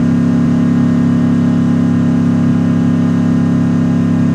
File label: Mustang Loop; Automobiles